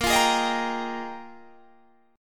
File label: Bb 13th Suspended 4th; Bb13sus; strummed